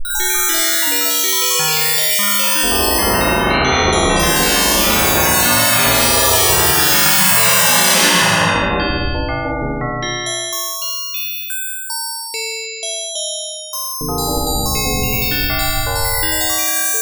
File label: STOCK NOISE, Synthet; USB Soundscan vol.33; AKAI; Disjointed Textures